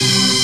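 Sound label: Rave Synth, Dance Mega Jungle Rave; Best Service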